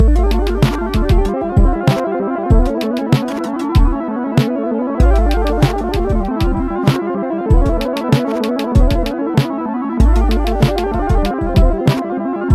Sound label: Lounge; High On Life; Port Terrene